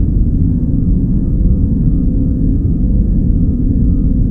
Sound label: ent interior; trek